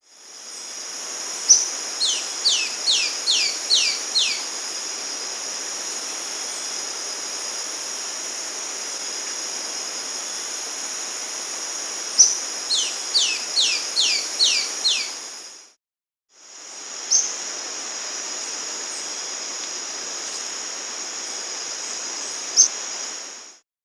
Tawny-Breasted Flycatcher (Myiobius Villosus), Andean Birds; S. America